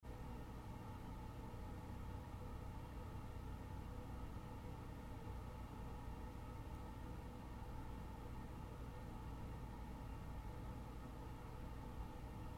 Room Tone Bedroom Hard Floor Light Vents; Backgrounds; Big Room Sound

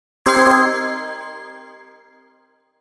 Bells Hit Bright Reverb; Production Element Imaging Element Accent Transition; 5.1 STEREO MIX; Blastwave

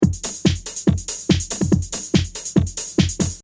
Techno-Trance Drum Loop 142 Bpm

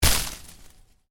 Foliage Impact, Hard Foliage Hit; Impacts; Miscellaneous Impact

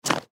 Softball Glove, Softball Glove Grab Grounder; Softball (Sound Effects:Sports); Blastwave

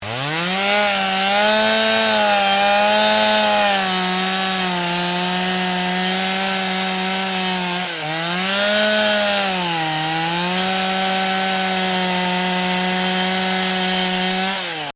Chainsaw: petrol chainsaw cutting at high revs; Machinery; Stuart Duffield

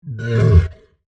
Monster Grunt, Horror, Monster, Grunt, Creature

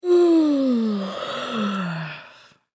Human Female Yawn; Yawning; Voice

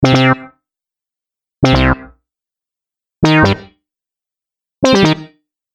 Error Notice Sounds, dong

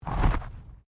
Body movement Fall Heavy

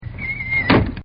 SHUT DOOR; Doors General; Miscellaneous Doors

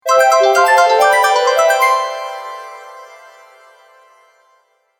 News Message, Cartoon

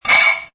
Metal Scrape short; Metallic; Lynne Music

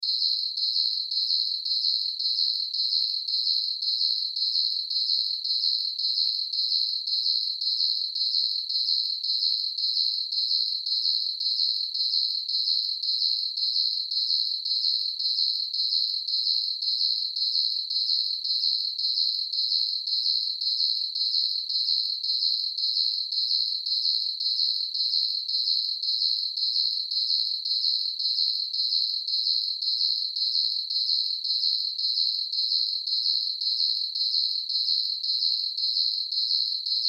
Crickets; Ambience; Chirps; Night; Insects; Bugs; Surround Sound

